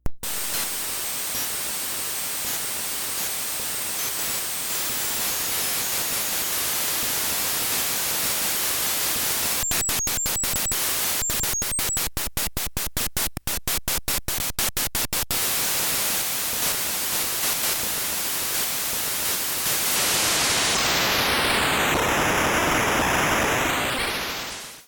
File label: noise